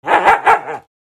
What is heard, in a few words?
Scared dog bark